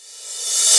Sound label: Reverse Cymbal